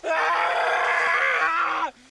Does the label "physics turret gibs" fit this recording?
No